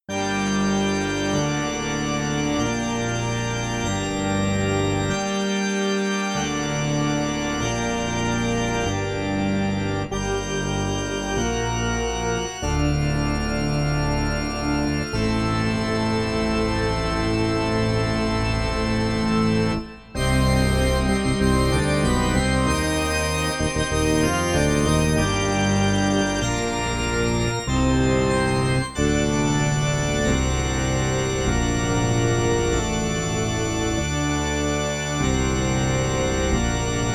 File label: church organ; mi1cd